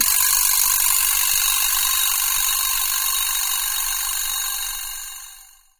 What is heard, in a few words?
vibraslap